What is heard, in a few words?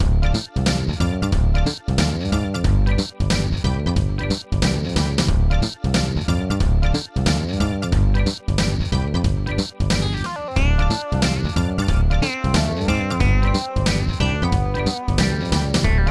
bubblegu, soft jazzfunk; Digital Symphony Collection